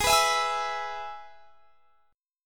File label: G sharp Minor Sharp 5th; strummed; x,23,21,21,21,24; G#m#5